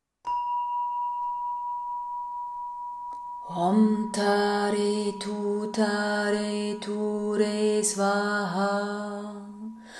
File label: Tara Mantra